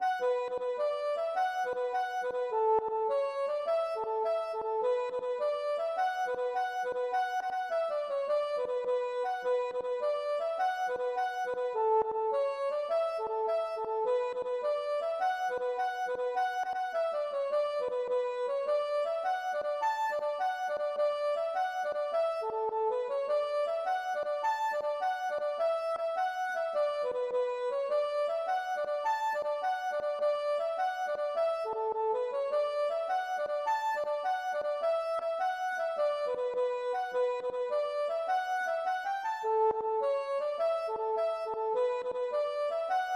jig

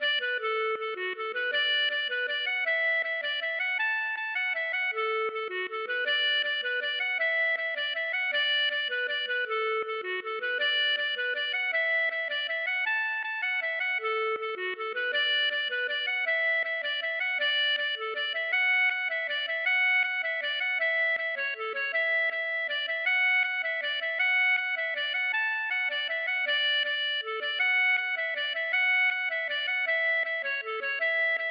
mazurka

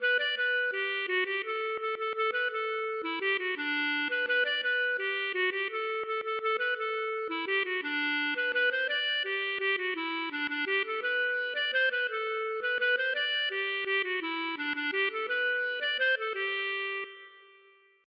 mazurka

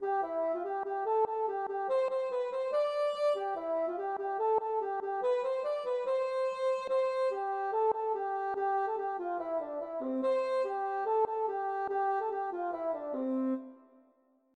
polka

